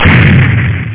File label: Explosio; Strike Commander